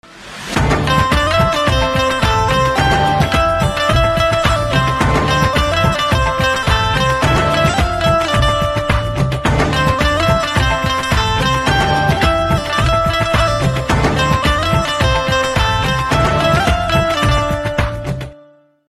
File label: Banjo Instrumental Ringtone